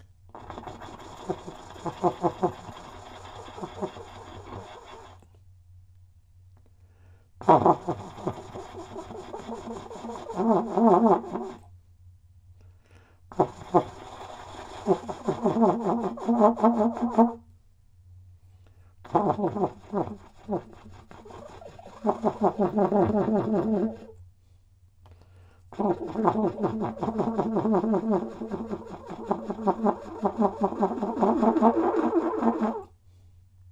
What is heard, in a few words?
bird